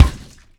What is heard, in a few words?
BD, Bassdrums, Roland TD-20